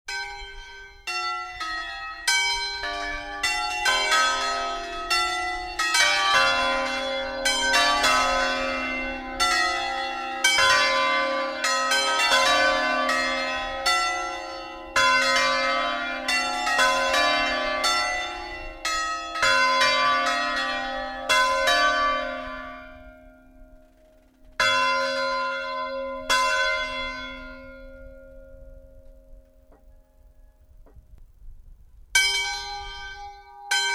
Suono funebre, campanari

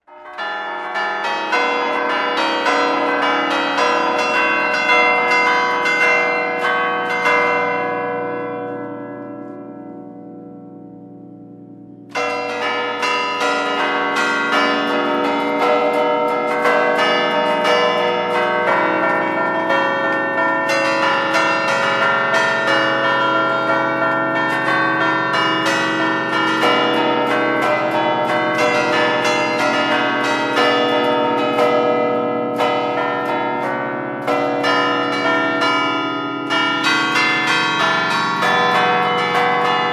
Valzer, campanari

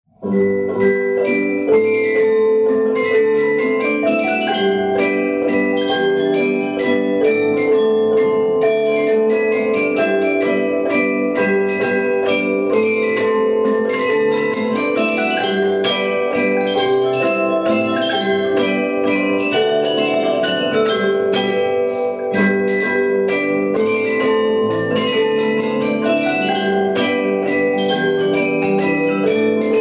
Mazurca, campanari

